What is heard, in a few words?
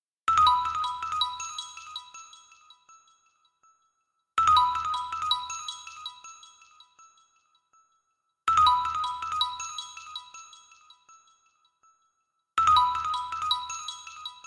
Marimba, ringtones; Alcatel OT-991D